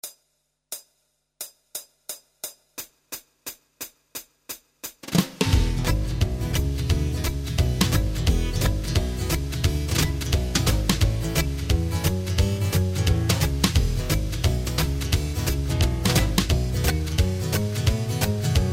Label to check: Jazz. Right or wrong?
Right